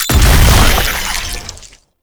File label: Proto Infested Maggot Explosion; Warframe; Abilities